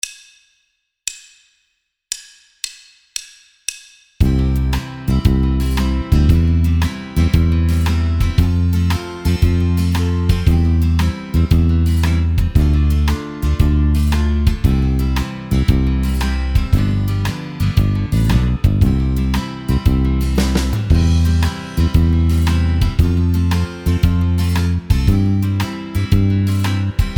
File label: ABC song Accompaniment only